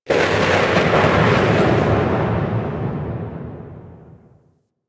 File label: Ship Exterior Thunder Claps; Warframe; Corpus Ship Remaster